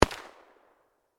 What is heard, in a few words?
Gun Rifle, Gun, Rifle, Muzzle, Loader, .50, Caliber, Thompson, Center, Distant, Perspective; Warfare; BlastWave